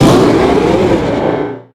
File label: pokemon cry